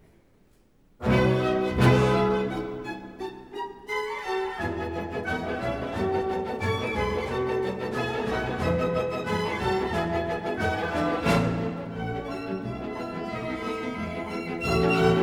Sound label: polka schnell for orchestra